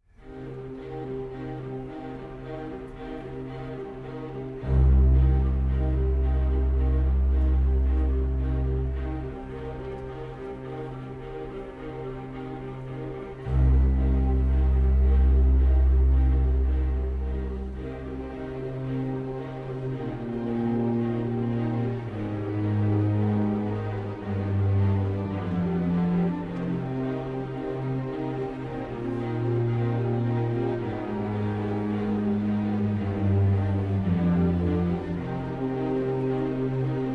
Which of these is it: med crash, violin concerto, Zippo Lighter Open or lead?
violin concerto